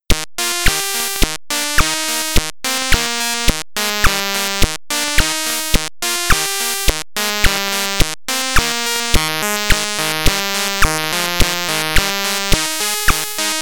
Title Screen (Beeper)

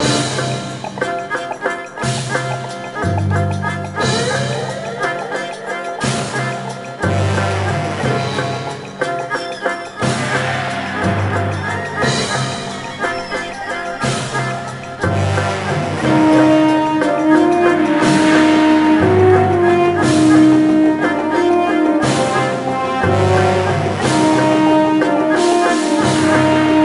BGM Combat; Level Shantou Castle

